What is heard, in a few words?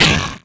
HIT; Eft; Greatwall Magician